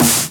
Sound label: snares; Vengeance Samples